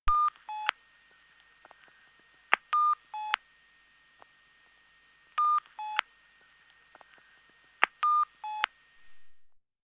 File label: BT Pushbutton Payphone, pips heard when operator dialled or telephone box called from outside; Historical; BBC